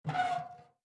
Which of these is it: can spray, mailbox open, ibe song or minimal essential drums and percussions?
mailbox open